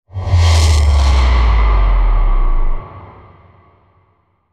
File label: hit complex short